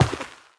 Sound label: step, footsteps, player, carpet